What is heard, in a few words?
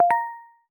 success